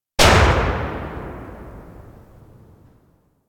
Double Barrel Shotgun Tail; Warframe; Tenno

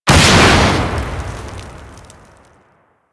Heat Blast; Warframe; Weapons; Elemental

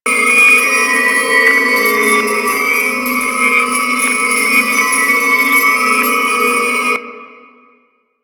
Hub Spirit Loop; Tenno; Warframe; Ambience

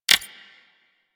Console Hack Button Press; Warframe

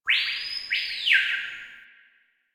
Ambient Bird Calls; Warframe; Duviri

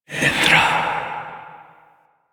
Fire Whispers; Warframe; Tenno; Grimoire

